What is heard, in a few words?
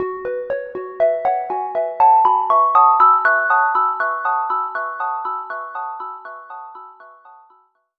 String Harp